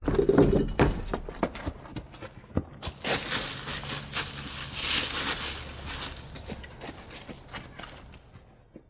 running

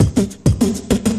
beef chowmain, beatbox; Drum loops

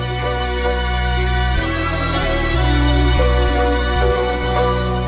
keys blurry

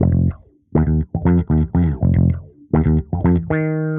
bass okay with me, loops; Phonky

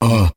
uh

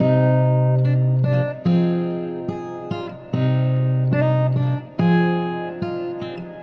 guiitar

